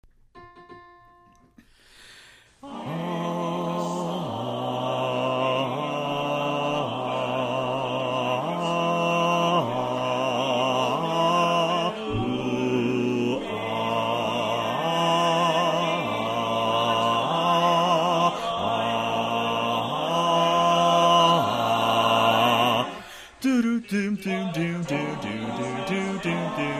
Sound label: bass left